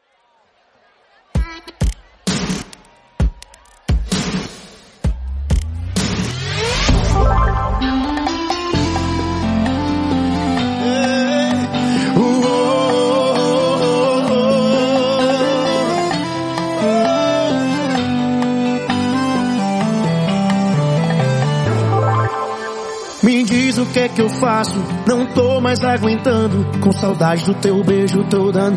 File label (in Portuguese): Forró